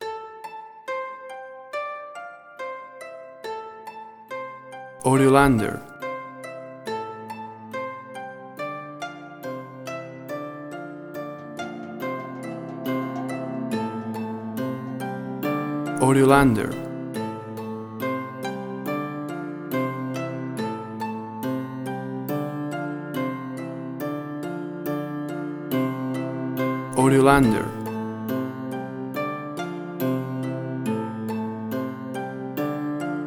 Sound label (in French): Jeux de Lumieres 70bpm